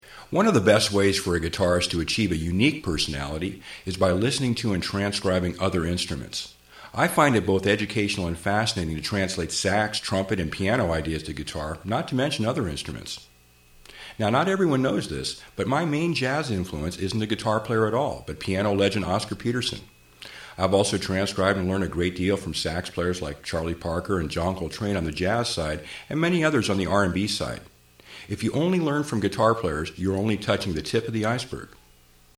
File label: non guitar